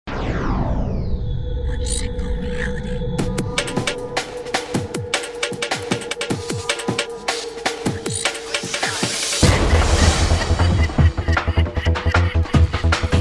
Rave Noise

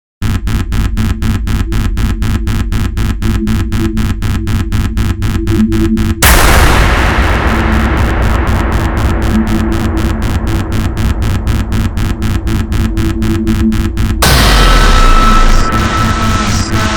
16th Note Sequence; Ninja Tracks

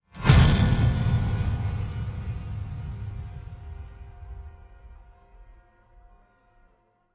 Dirty Bomb; Gothic Storm Music

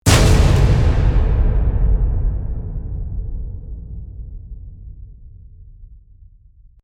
Gate Hit Epic; Ninja Tracks

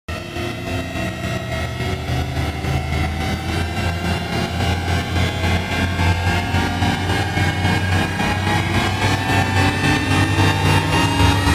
Pulsating Rise; Ninja Tracks

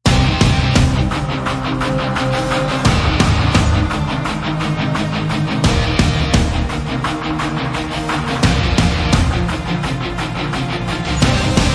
No Choir; Gothic Storm Music

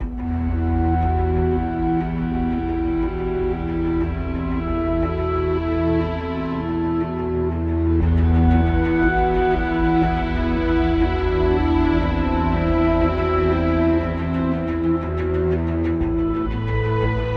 Intense Ambient

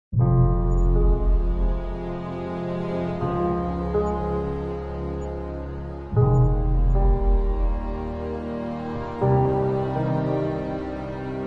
No Vocals, Epic Action & Adventure

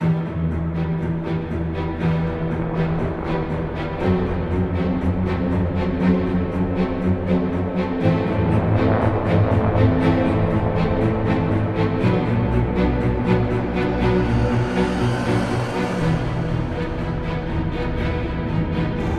Vengeance Ambient

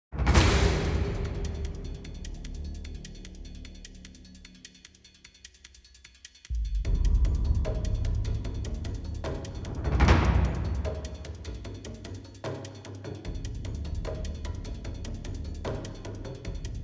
Percussion; Fired Earth Music

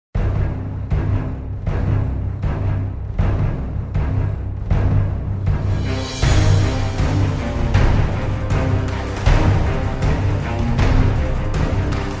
No Vocals

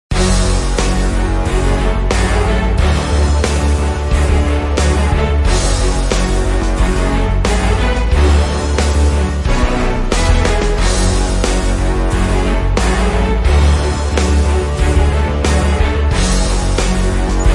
No Vocals, Epic Action & Adventure

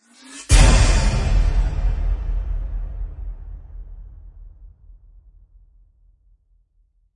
Impacts Demolition; Gothic Storm Music; Advanced Sound Design

